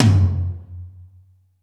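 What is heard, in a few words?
GATE tom; Crypton - DrumBase5000; GATE KITS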